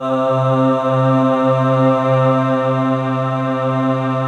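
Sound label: slow orchestra pad, Slow Pad 5th; Optical Media International; Sonic Images Library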